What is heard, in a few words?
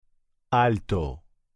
alto